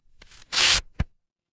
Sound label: paper fabric tear